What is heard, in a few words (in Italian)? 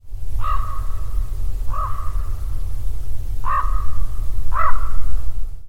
Corvo Imperiale